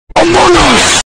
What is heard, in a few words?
among us yell